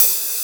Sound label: HAT BI-DIR; Ensoniq ZR-76